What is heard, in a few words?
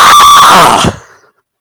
cow pain; svencoop; cow tycoon; gonome replace